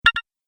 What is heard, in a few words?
Interface Sound, electrical button